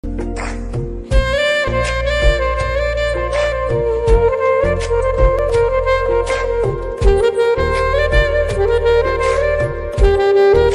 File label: saxophone music ringtone bgm music instrumental music